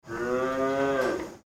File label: cow